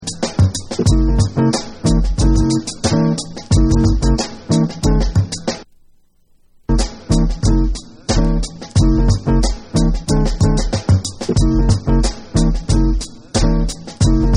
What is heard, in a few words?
Instrumental